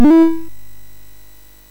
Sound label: Dragons Den, MOS6581R3